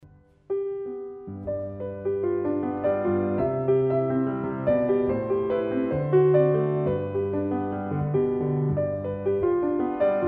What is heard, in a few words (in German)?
piano solo volk, piano volkslieder; Liebeslied